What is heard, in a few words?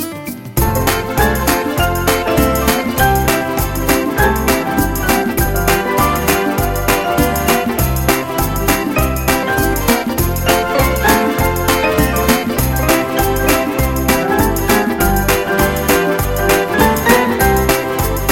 Great Dancing Mambo